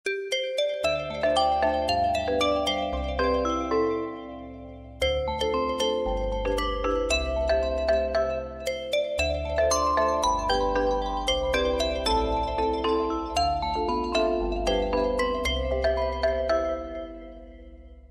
Official Oppo, ringtone; A8

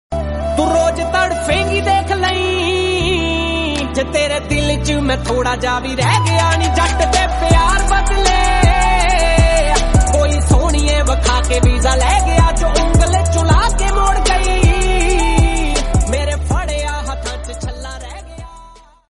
LoFi

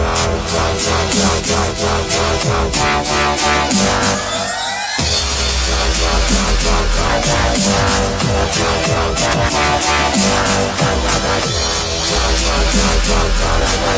Vivo Electronic

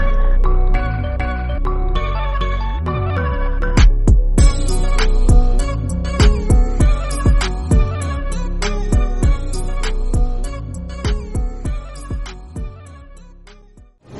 BGM, ringtone

